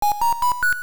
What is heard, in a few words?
Dungeon Signal; Zelda